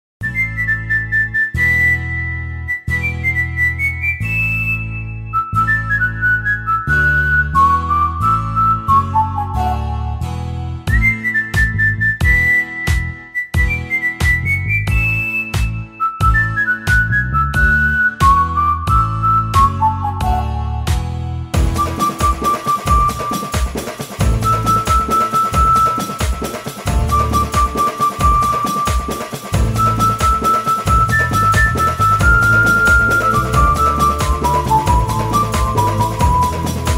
Flute; Bigil; Tamil

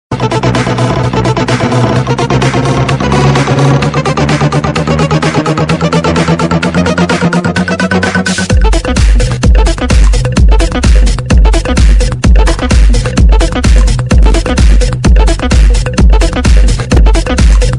Techno Ringtone

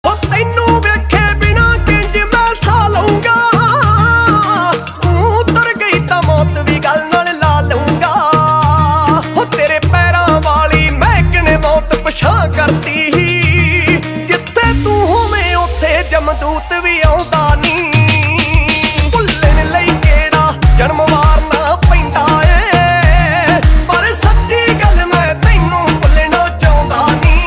Punjabi Beats Ringtone

